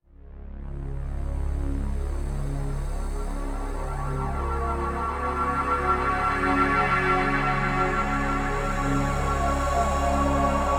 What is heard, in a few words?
roland m vs1